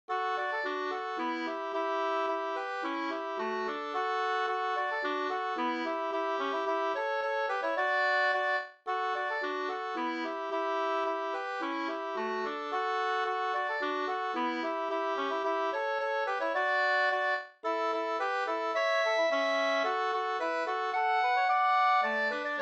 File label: polka